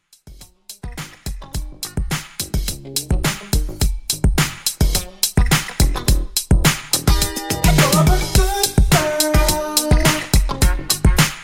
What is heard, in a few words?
80s 105Bpm